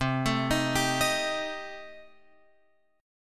C Minor 6th Guitar Open F, chord; Cm6 arpeggiated; JGuitar

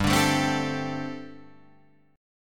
G Suspended 2nd Suspended 4th G, Guitar, Drop D

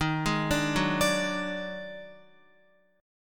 Eb Minor Major 7th Double Flat 5th Eb down Guitar

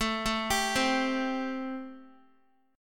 A Minor 7th A, Guitar; Am7; arpeggiated; chordshape; x,12,9,12,12,x

